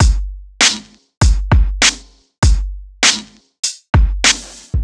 Raw Brk HAT; Rawcutz - Nu Forms

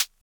closed HH, Univox SR-95

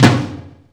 YAMAHA R5+RX7, DRUMCOMPUTER; Retro Sampler

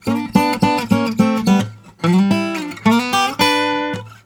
Blues Guitar; ILIO; Fretworks; LICK

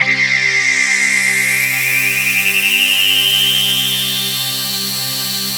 SYNTH FILTER; E-MU Formula 4000 Series Vol. 2

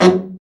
BRA BARI, Bariton Sax

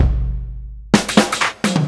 Fills 128BPM, Clubsounds

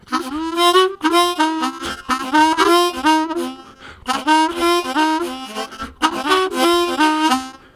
Low Chatting, Harmonica Riffs; Zero G

